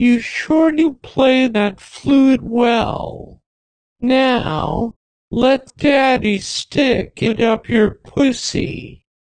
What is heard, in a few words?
flute